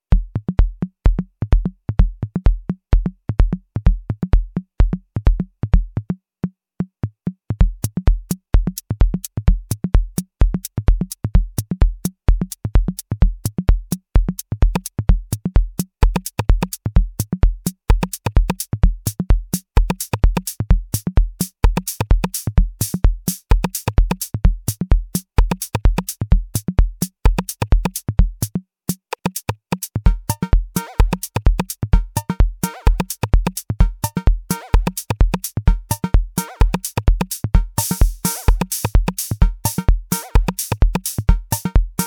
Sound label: MFB Tanzbär Lite Minimal